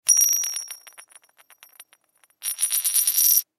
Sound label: Coin Spin Single Pirate Gold Concrete; Money; Foley; Blastwave